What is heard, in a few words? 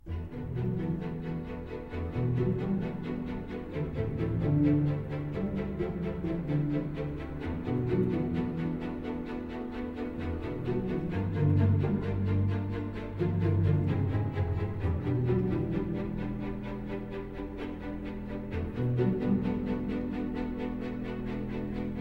Intro Loop; Cranktown